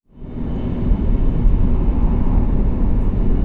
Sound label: corridor; Dystopia; silo